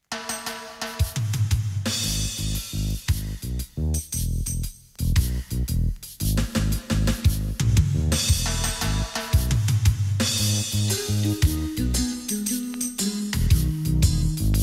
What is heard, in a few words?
Brazilian Reggae & Boogie